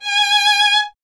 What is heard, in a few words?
STR VLN, Vln Detache; Miroslav Vitous